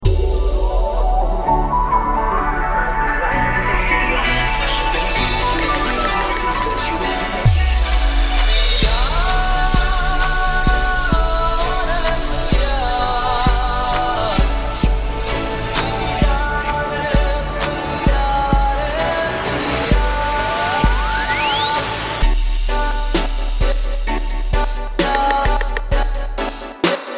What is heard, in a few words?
dubstep